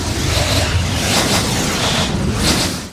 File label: mini tornado; weapons; dota; invoker